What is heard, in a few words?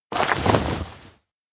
stop crouch; anemoi パッケージ版